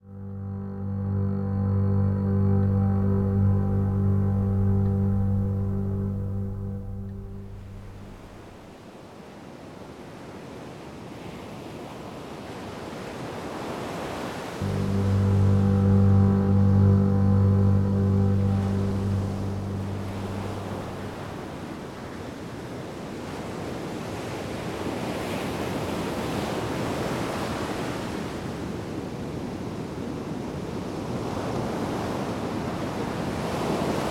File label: slowdown